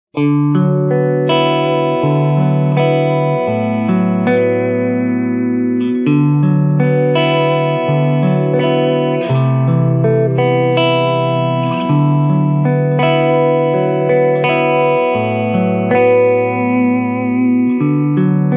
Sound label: vibe